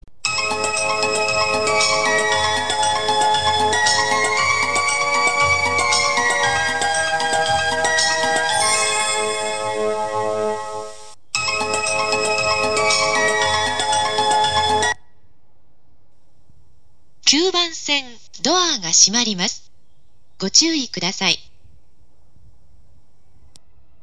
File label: utsunomiya, eki; higashinihon